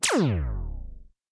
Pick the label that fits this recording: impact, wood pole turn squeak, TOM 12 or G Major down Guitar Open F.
impact